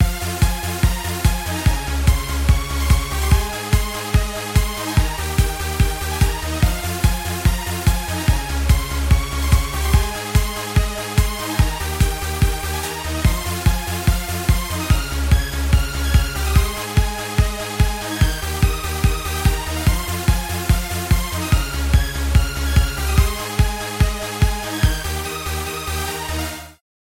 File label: lead trance wave